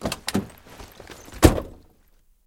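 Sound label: opening trunk door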